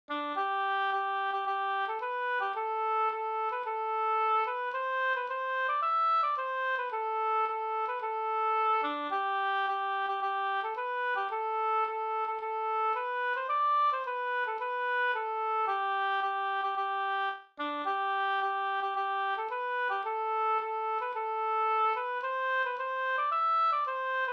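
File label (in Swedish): brudmarsch Bruralat fr Valdres, Norway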